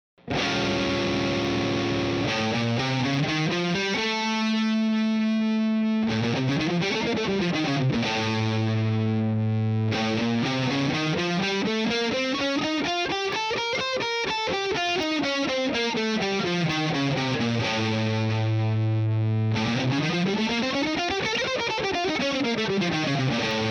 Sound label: altered scale